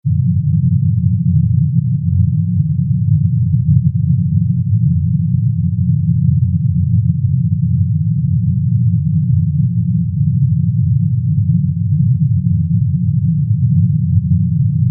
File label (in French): bruit rose